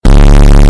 bass boost

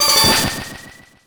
ice shock

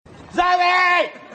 zombie; norman reedus

